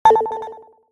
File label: notification